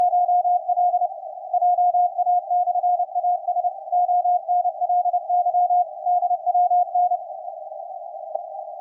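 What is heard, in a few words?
160m, signals on 160m band; 3C1L; RAØFF; 80/160 meter bands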